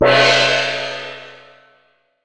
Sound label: Asian Gong; Roland BK-5; Percussion; Gongs; Cymbals